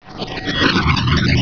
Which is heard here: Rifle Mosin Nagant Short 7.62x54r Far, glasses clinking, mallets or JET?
JET